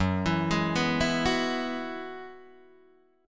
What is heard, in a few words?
F sharp 7th Suspended 2nd Suspended 4th F sharp down Guitar DADGAD